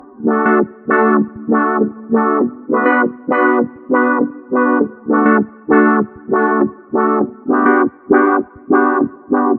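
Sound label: 100 D major Synth Honey Dips; Zero-G; Future Wave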